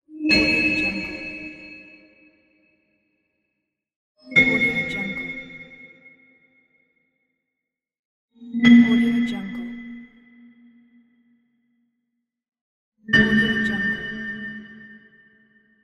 magic slide bell

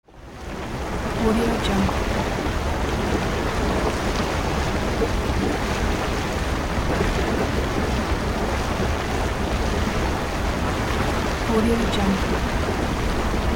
geyser

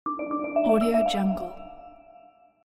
notification

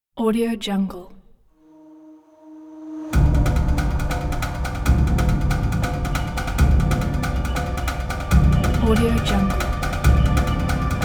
action sountrack

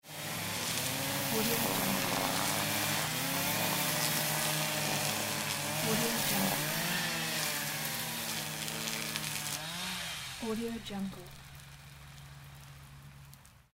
lawnmower

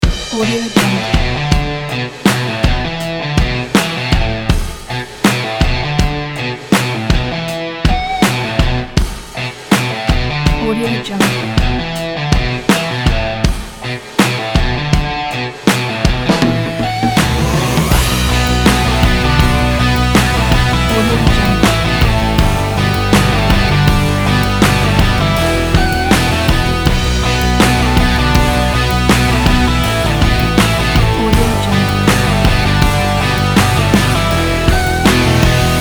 energetic rock

